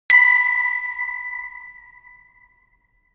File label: notification glockenspiel creepy single